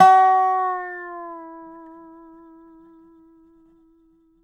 dobro; DOBRO DOWN; Country

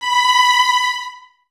Violins B 5, String Ensembles; Miroslav Vitous; LINKS ORCH

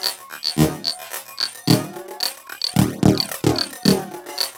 LOOP, TECHNO; TECHNO-BOX; ELEC-LOOPS

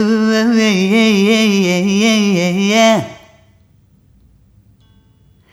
Rhythmic A

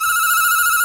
BON DEE D3#

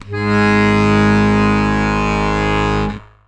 ACCORD BASS G#1

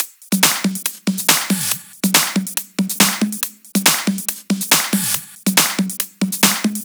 drum Loop 140BPM Full; Vengeance - Essential Clubsounds Vol.5; VEC5 Drumloops; VEC5 Multi Loops 140BPM

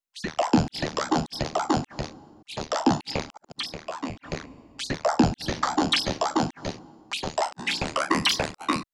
Random glitch

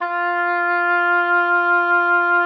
Oboe, F4; Xsample VOL-6

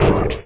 Spitter Hit, Esoterik Beatz; Spitter Kit

